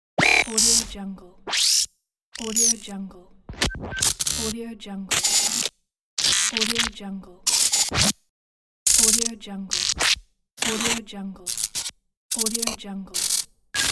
glitch pack

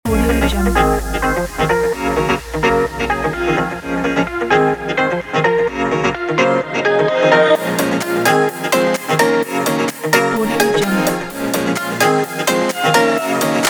dance

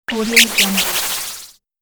magic flutter spell; sonic-boom

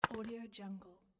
paper clip drop on paper sheet; mountainaudio

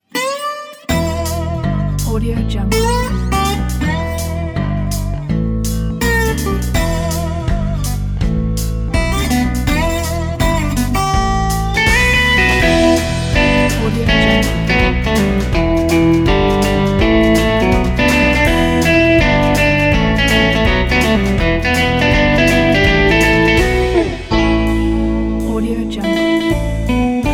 cowboy whiskey bar

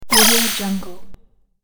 swipe sparkle spell

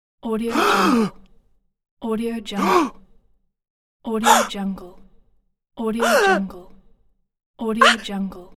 scare

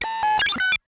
tone clusters bursts of high pitch beeps ui

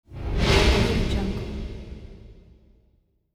whoosh panorama

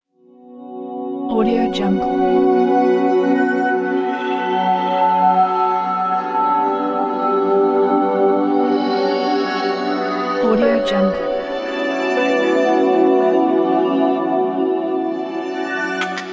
ambient logo background pack

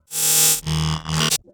synth sawtooth; pooper; soundmorph

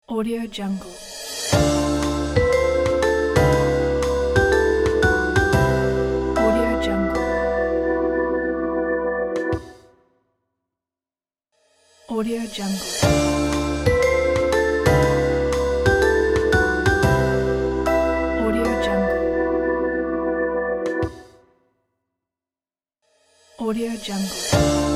jazz logo

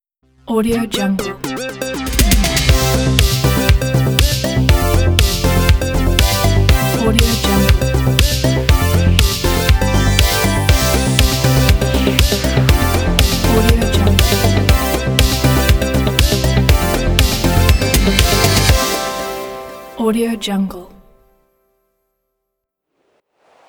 synthpop 80s logo

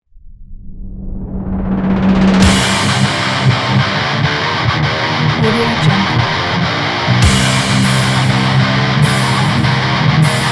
metal

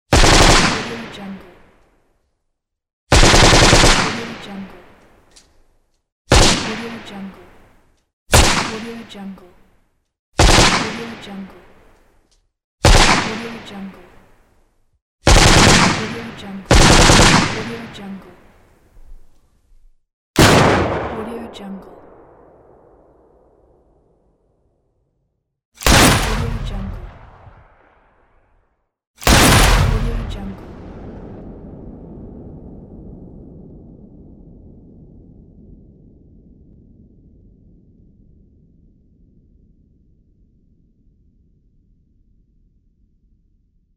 gun shot; volkovsound

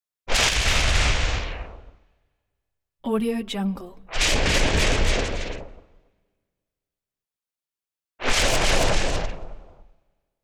lightning strike sharp whipping bursts; prosoundfx

